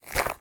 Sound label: grass, dig; HunterZ G4; minecraft